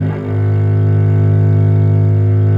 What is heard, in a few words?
VL G#0, VIOLE, Historical Instruments; USB Soundscan vol.23